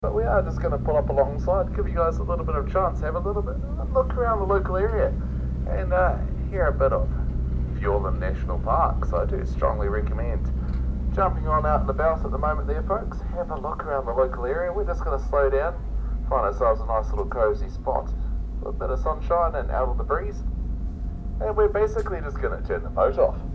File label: Doubtful Sound; 'Silence' in Doubtful Sound, Aotearoa (New Zealand) on 15th February 2025; Will Coley; Field Recordings